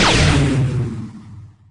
sniper blaster

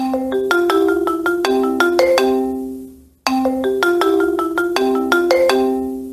ringtone; gta 5 michael

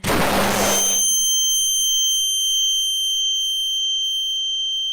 flash bang

